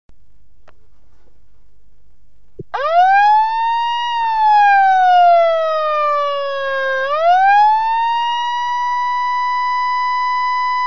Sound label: siren